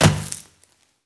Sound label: hit dense room; wob2 demo